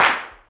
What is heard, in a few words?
clap sample f key, Royality free clap one shot tuned to the F note. Loudest frequency: 1828Hz; percussion; single hits one shots